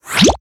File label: Pullout Cannon